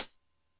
Cym Rock Tip, Drum Machines Alesis D4

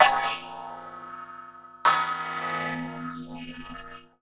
Ring Mod, Cymbal, contact mic; Murf & Ring Mod

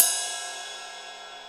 RIDE, DRY; Crypton - DrumBase5000; DRY KITS

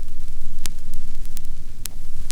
CRACKLE FX L; Big Beat Samples & More; AKAI; OTHERFX KIT

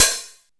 Op hh, Jomox Xbase09

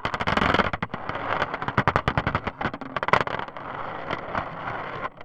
Corrigated Iron, contact mic, Marbles

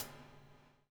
BUBL, Backbeat; Spectrasonics - Backbeat

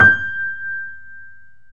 PIA GRND, Roland; Sound & Vision; Gigapack I CD 2; PIA GRAND 2; PIA Grand 6

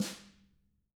TAM 3 HIT, Drums; TAMAKIT

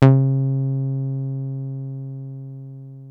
303 C3, TB 303, Synthesizer; Hollywood Edge; Giorgio Moroder Rare Synthesizer Collection

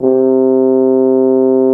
FR HORN G2, French horns; Greytsounds VOL-1

